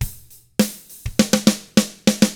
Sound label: ROK, Drums